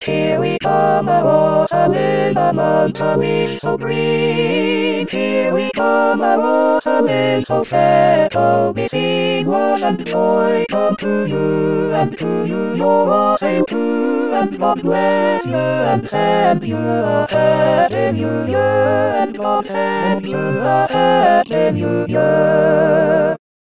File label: Here We Come a wassailing ALL